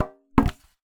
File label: Polystyrene Rattle